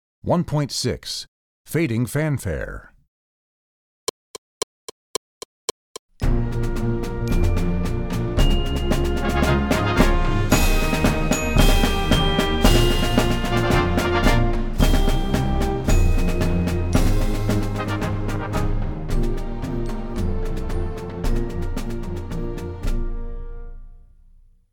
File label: Fanfare, Percussion; Percussion Book 2